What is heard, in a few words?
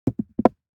DICE on Felt, Throw and Roll, Metal, 1 One Die; Samples; ArticulatedSounds; DICE Sfx Party (Extended)